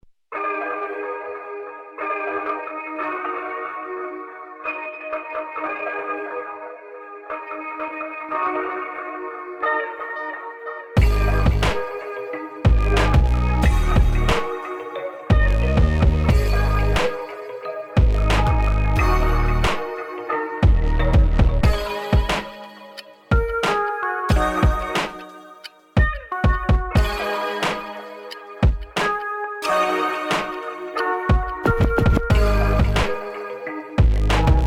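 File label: mountain dulcimer boom bap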